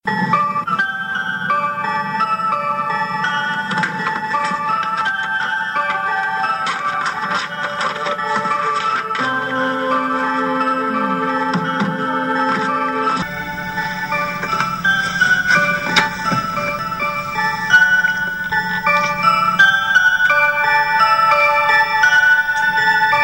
funny theme

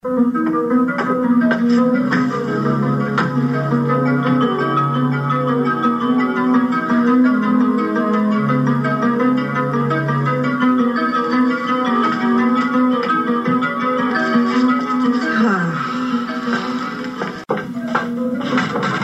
dramatic theme

